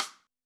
RIM 1, Yamaha SY85, Drums

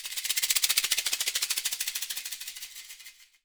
African shaker; Voice Crystal - Steve Reid Definitive Percussion

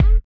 BUZZER; Spectrasonics; Metamorphosis

